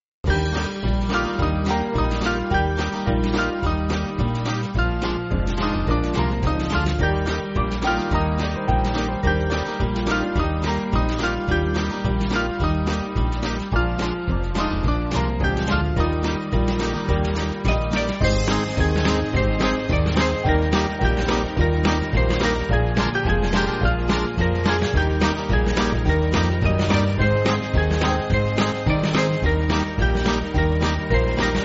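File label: Band, Music Backing Files for Churches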